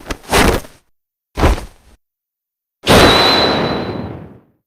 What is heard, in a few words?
clothes off